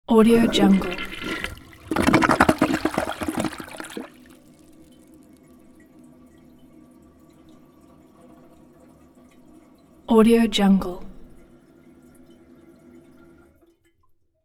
toilet deep flush and fill; sound-ideas

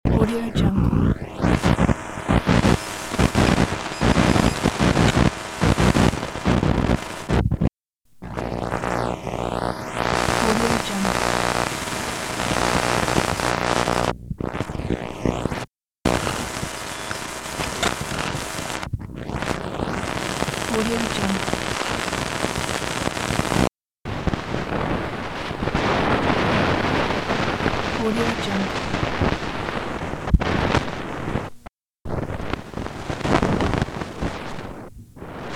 static interference noise

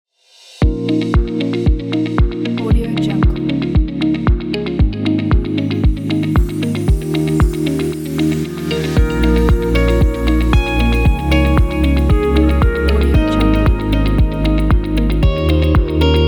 business event

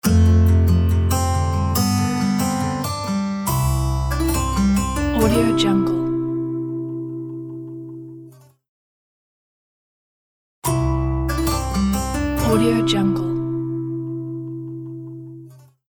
acoustic guitar logo

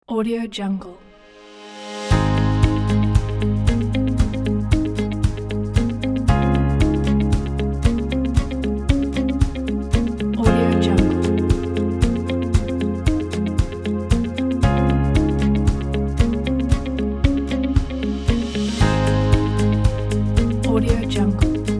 inspiring corporate pack

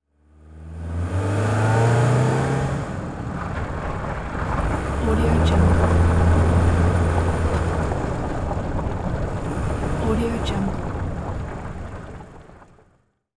car pavement to gravel accent

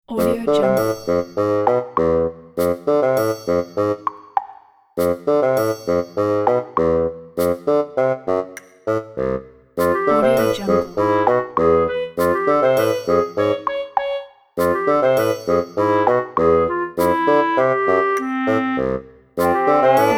cartoon comedy track and loops

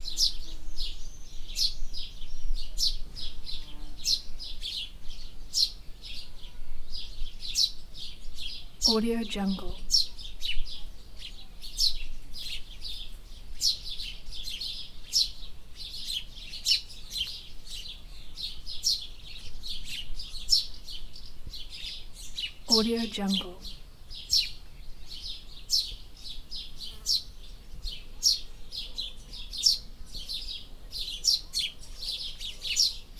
birds

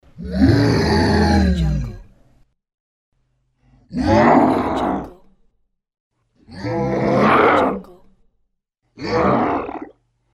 mutant monster growls; fxprosound

